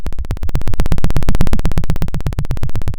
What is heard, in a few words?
pop, Sound of a helicopter